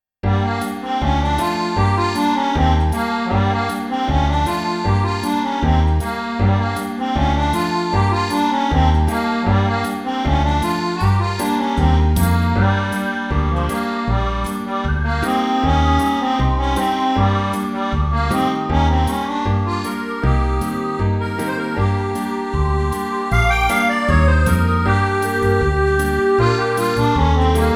Blues Rock in Gm Folk whistle 156

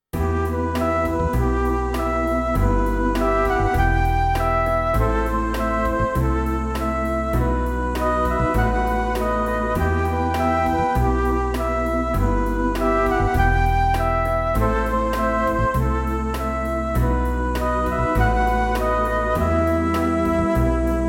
Big Ballad Classic Theme in Em 100 bpm

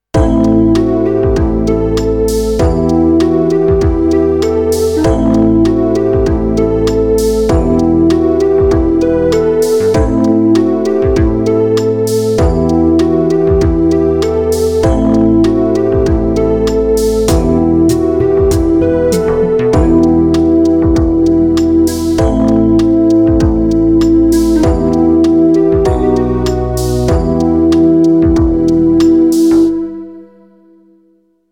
Em Riff

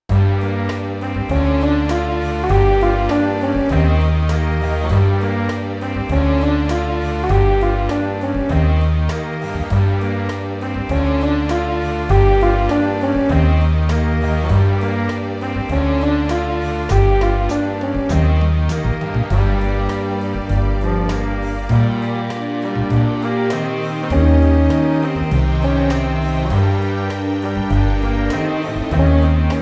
Blues Rock in Gm Ballad Flügelhorn 100

